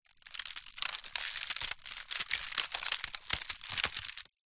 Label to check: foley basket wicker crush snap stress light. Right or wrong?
Right